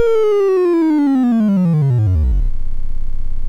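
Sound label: Petch, MOS 6581 SID chip music, games; hvsc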